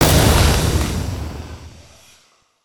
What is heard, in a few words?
Serpent Smashable; destructibles